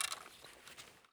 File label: gear stereo; Escape from Tarkov